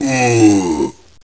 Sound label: dizzy; birdie